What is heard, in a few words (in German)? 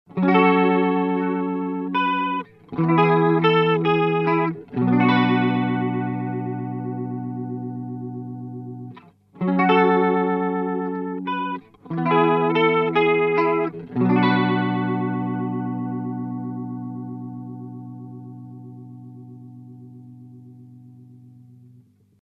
Chorus schnell Sound